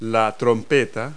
trumpet; Multi-Lingual Talking Picture Dictionary

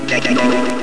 TECHNO

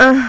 Moan; MacFoxes Adult Game; Girl2Dungeon

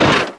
SCRAPE; ImmersionRacing

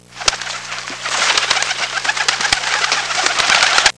pigeons flying; Sound Effects for Multimedia - Volume 1; birds

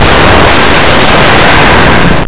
plunge

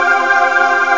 choir; sus4

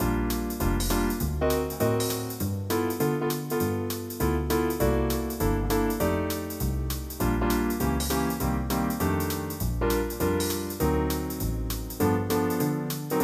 swing

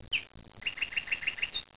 Nightengale; Close Combat

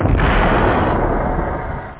CRUNCH; da capo vol1; instruments

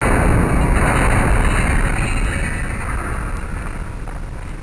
Spacecraft Collision; Soundsation; Vehicles

